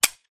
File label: gun blank; RussianRoulette; PainJam